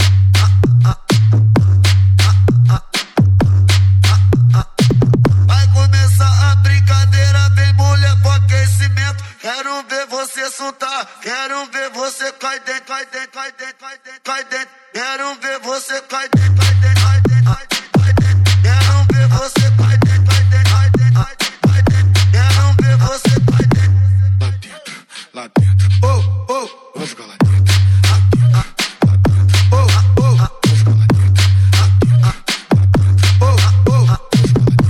funk bass

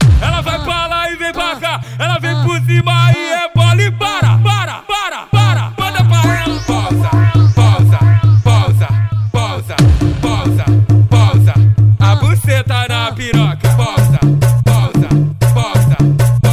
funk bass

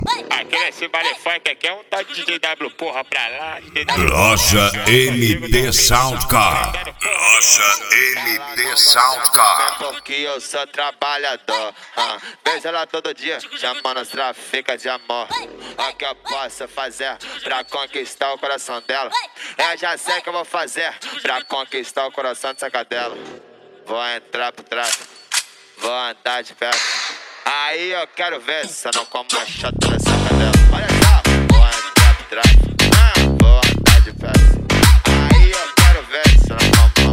sound car; mt sound car

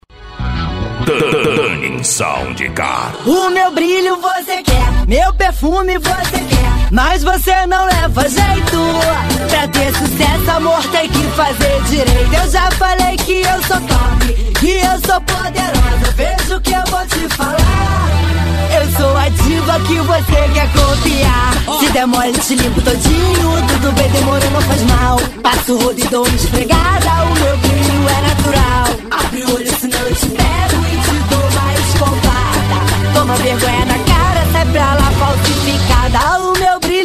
tunning sound car; g4 tuning sound car

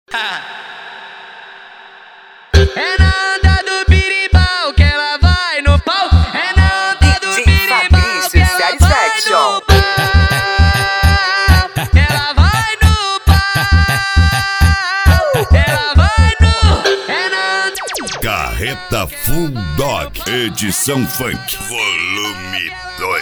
funk

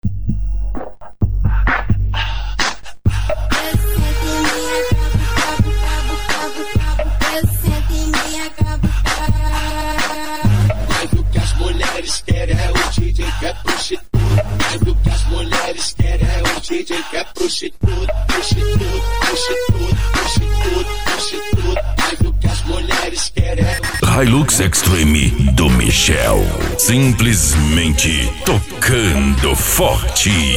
funk mega bass

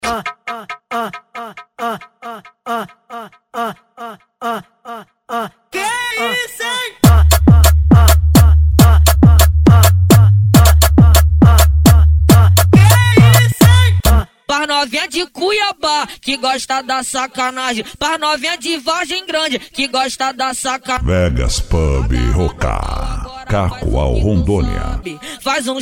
funk mega bass

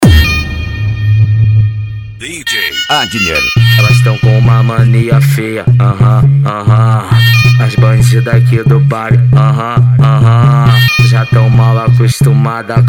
funk tumdumdum funk bass

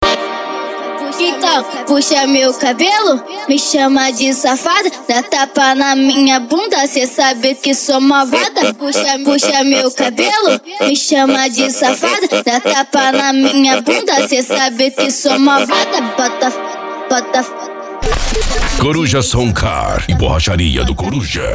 funk; coruja som car e borracharia do coruja

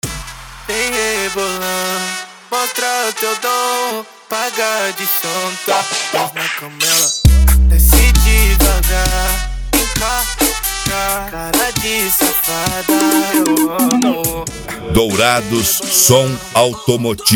mega funk; dourados

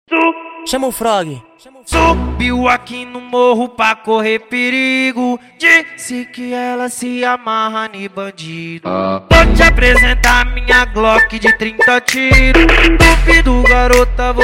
especial funk; cd bad boy som automotivo usa

